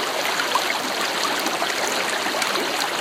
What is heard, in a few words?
river; STALKER